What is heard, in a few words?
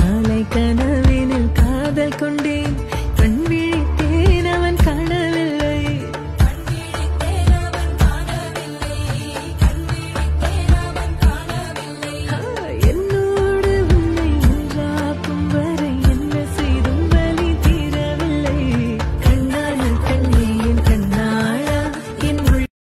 Ringtone